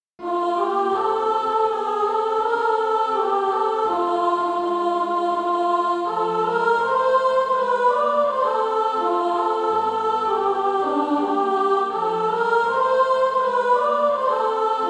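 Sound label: soprano, Practise Music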